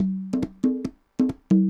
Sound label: congas 140bpm, latin; Sound Effect Library